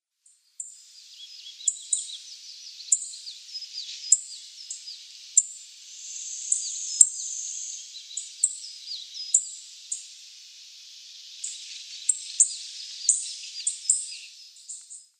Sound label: Chestnut Bunting calls